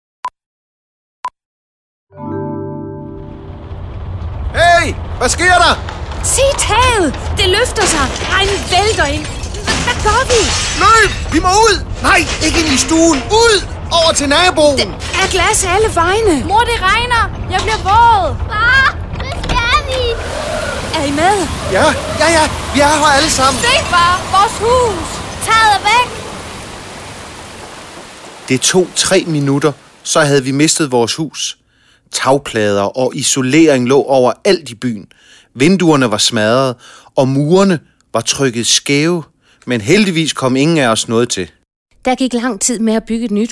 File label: tornado over Ilskov